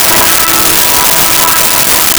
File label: Brass Sect E4; Nintendo; July 2020 Gigaleak